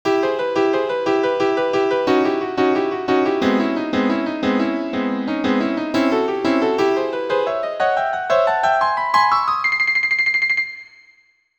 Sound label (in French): rythme irregulier plaqué; autruche